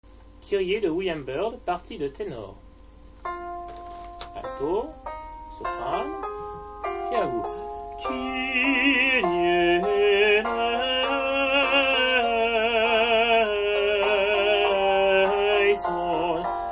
Kyrie tenors; byrd

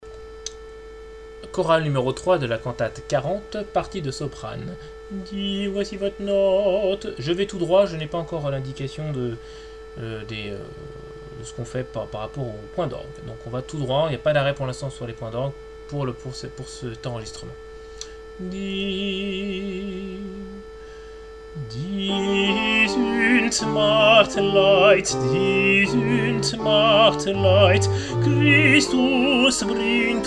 coro sop; bwv 40

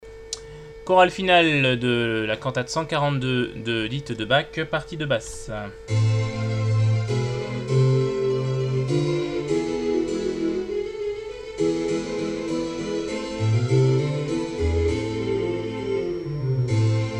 alleluia basse; bwv 142 8

